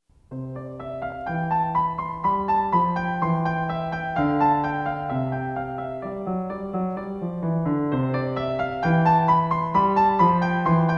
Sonatina In C Major (Allegretto); Magrath Book 03

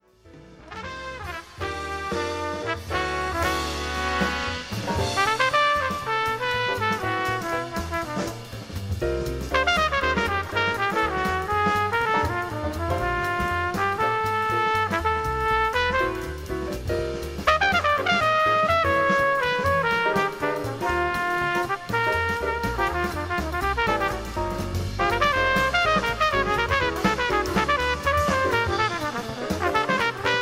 solo only, jazz trumpet

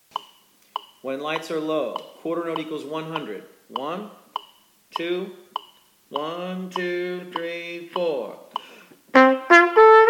Play-along, solo, qn=100; trumpet

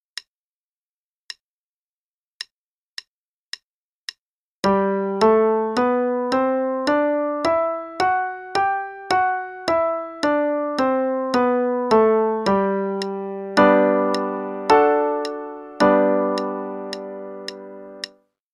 G Major Scale and I-V-I (qn=108); CM Level Prep; Daily Warm-ups

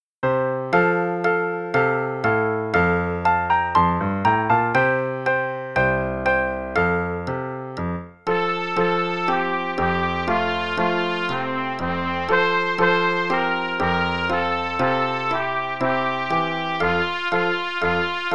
Trumpet Tune (in concert F, qn=120); Play-a-long; Belwin

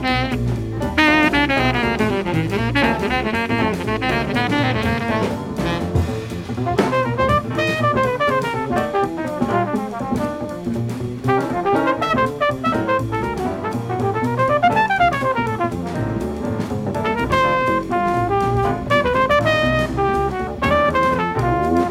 trpt solo only, jazz trumpet

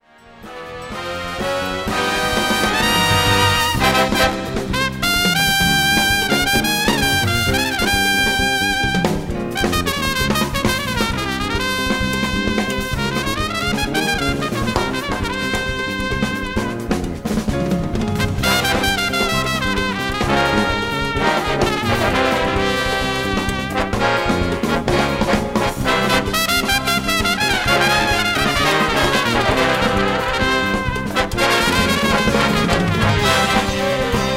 trpt solo only

